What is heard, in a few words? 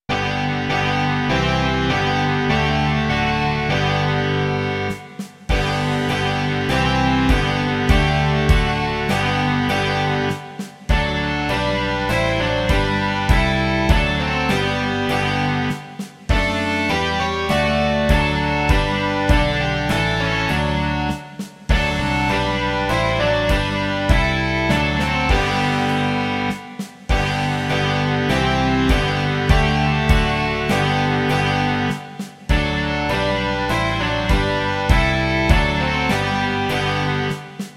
rock